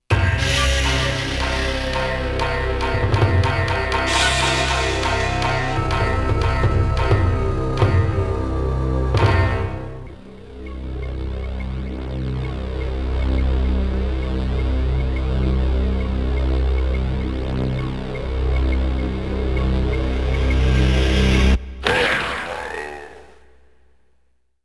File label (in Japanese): 黒星登場～介錯デモBGM; konami amusement sounds 93 summer